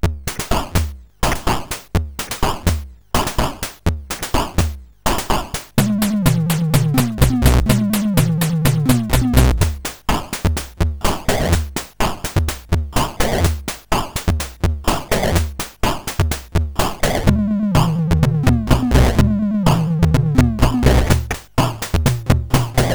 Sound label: Drum, sid CSG8580R5; hvsc